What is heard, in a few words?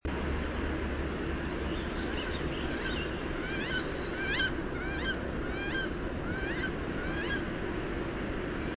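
Wood Duck hauk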